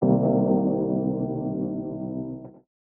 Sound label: C Keys, One Shots; Keys & Synths; Touch Loops; BlissedOutBeats